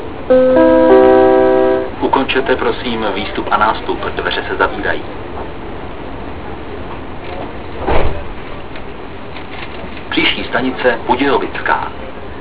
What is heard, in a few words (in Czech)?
odjezd, metro; Ukončete, prosím, výstup a nástup, dveře se zavírají. Příští stanice Budějovická